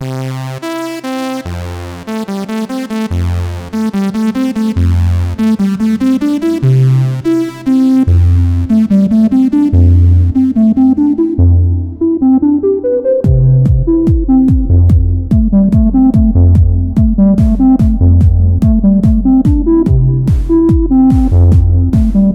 flower child filter clean

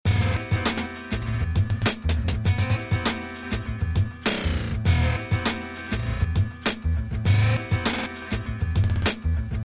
glitch loop

